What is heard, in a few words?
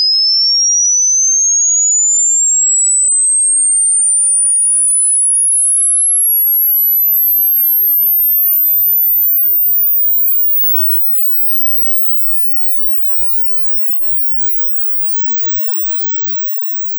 Sin Waves 5 22 kHz; Son sinusoïdal de fréquences variants de 5 à 20 [kHz] en 17 secondes.